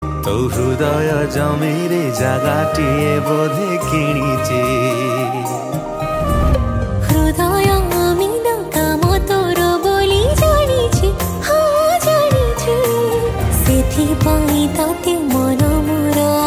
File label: Sad, Odia Ringtone